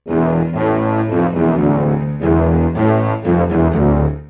cellos

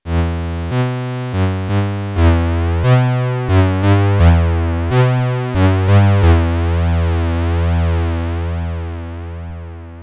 1985 JX-8P chorus

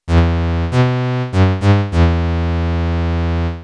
1985 JX-8P VCF

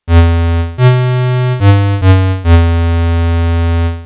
1985 JX-8P VCO1

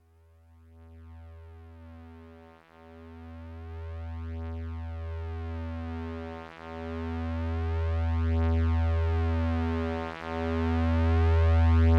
Odyssey VCA Gain

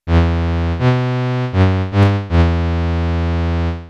1985 JX-8P VCF

